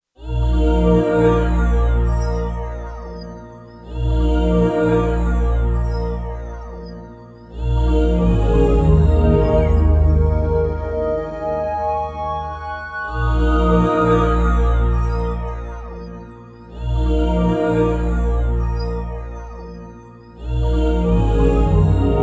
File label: Pipe Interior; Super Mario Galaxy